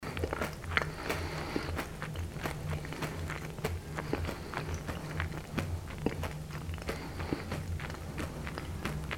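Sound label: sound of chewing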